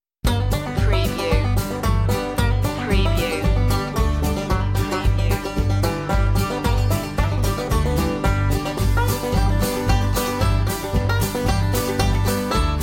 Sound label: back porch stomp